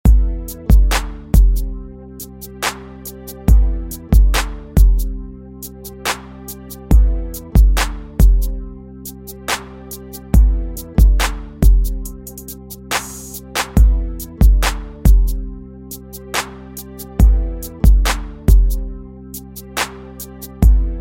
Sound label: Chill sample, hiphop